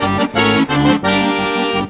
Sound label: ACCORD HITS; Best Service; World Colours